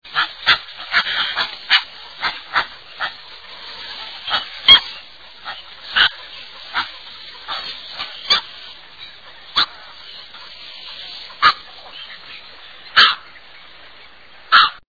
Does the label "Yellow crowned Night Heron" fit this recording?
Yes